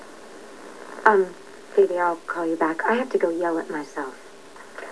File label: yell